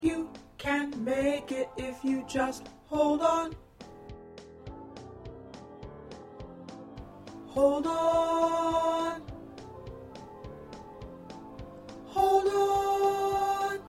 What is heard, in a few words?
Tenor, choir